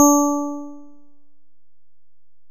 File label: Bell, Alesis DM-PRO drums